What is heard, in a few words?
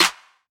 Slap